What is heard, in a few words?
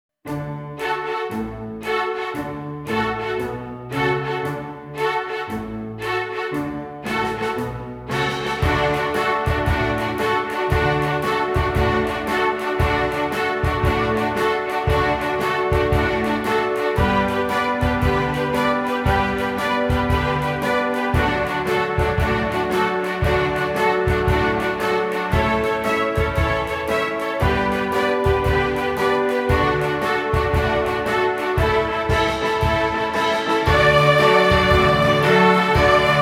Fiddling Around Blues Full Ensemble